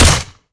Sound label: silenced; garrysmod